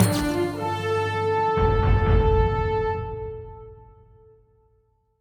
stings levelup; Armello The Bandit Clan

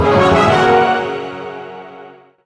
blue team goal; skyhook

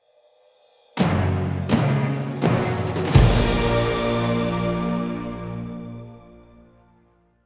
Final Battle - After Boss Fight - Combat

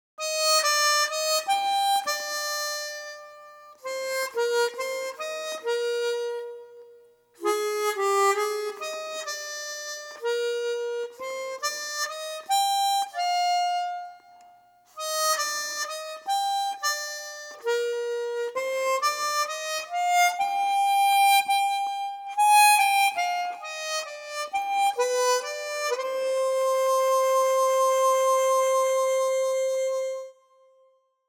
Harmonica short Ver.; the legend of heroes sora no kiseki fc evolution

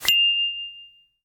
Caramel Miss Sound; Jolly Battle; Android

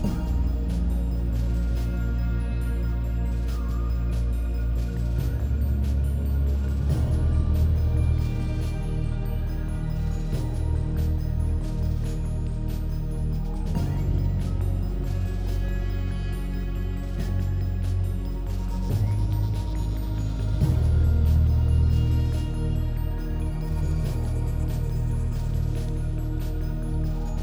Menu Anti Action LOOP

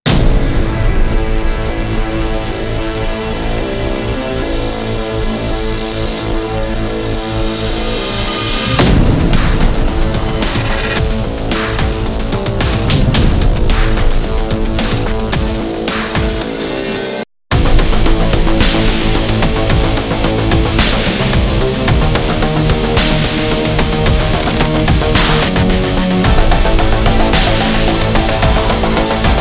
music boss music; rogue stormers

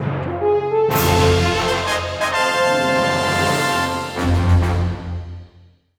Music Soda Can; Music Legendary Hat

